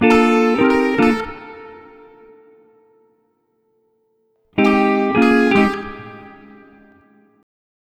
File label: Multi Guitar Bossa Gtr-A#, 105; Zero-G Kocktail Kollection; Construction Kitz (Lounge)